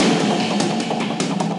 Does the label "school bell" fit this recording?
No